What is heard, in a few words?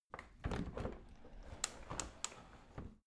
window handle open tilt soft; objekte; fenster